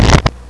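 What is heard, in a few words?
shuffle, cards; ueiBlackJack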